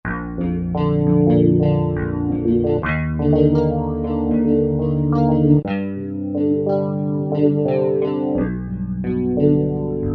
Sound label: Smooth Strat, Roland SR-JV80-12 Hip Hop Collection